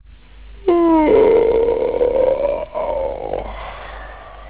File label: yawn; Bagpuss; Bagpuss is a magical cat. When he wakes up, all his friends come to life.